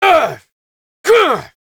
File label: parry finisher vocals; Enemies; Drifter; Warframe; Duviri; Dax